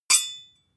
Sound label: sword hit scrape soft